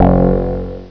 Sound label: wow bass; Prince of Persia 2 Demo